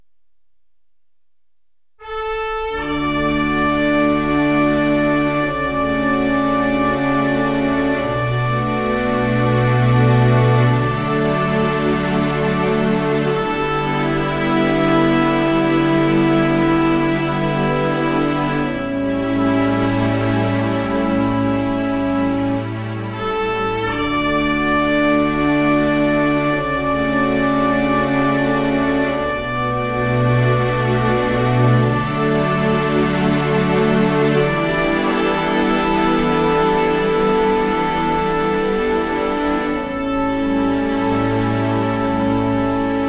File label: Dramatic Cue